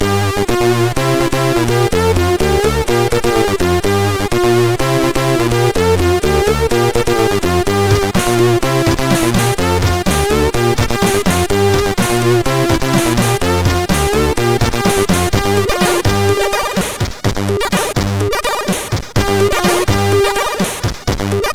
Funky Sound Machine, sid CSG8580R5

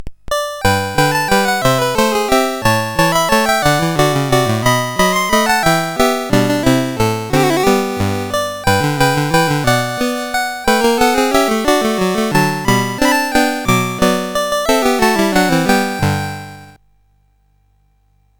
Season Jingles, CSG 8580; CSG8580R5

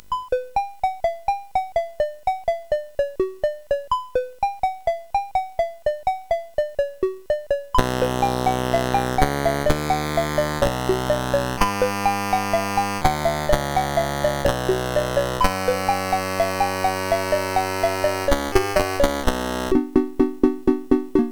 CSG8580R5

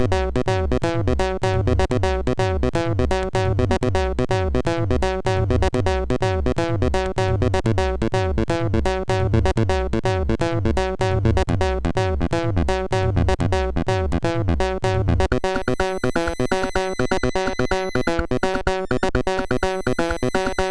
Ambient, MOS6581R3

